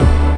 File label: Cool Brass Hit; Proteus 2000; Multisamples; Digital Sound Factory Proteus 2000